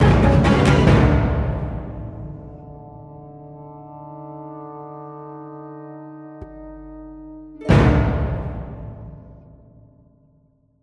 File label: Sting Kill